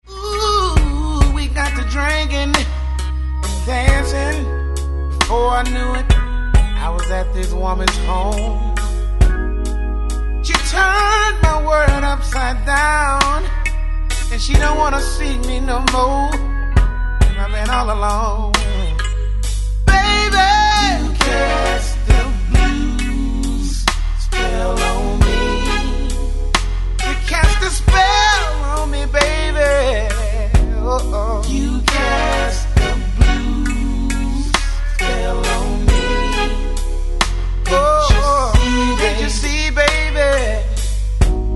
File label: Blues